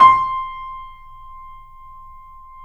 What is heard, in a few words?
C, Piano; InVision Interactive - The Piano